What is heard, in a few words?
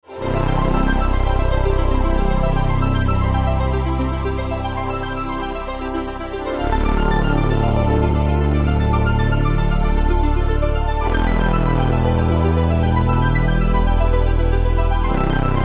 vp + taur + ju60